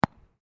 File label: SPORT BALL RUBBER BOUNCE CONCRETE